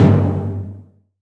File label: TIMPANI C2; AKAI S-Series CD-ROM Sound Library VOL-5